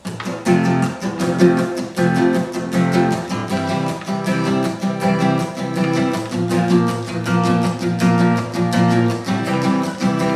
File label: acoustic cover